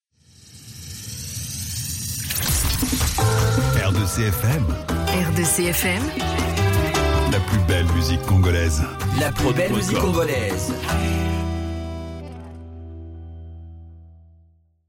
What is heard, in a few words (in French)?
Sweep Musique congolaise; R2C Fm